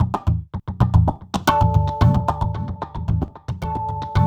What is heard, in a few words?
TABLAS; Zero-G; Chemical Beats